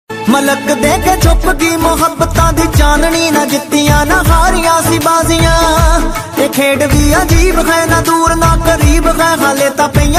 new punjabi ringtone new punjabi love ringtone new punjabi sad song ringtone